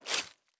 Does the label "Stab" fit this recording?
Yes